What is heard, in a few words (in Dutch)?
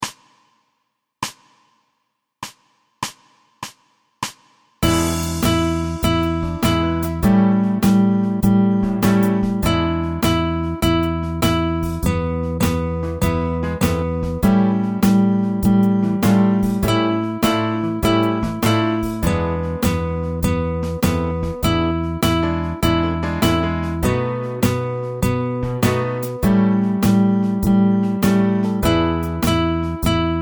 bluessolo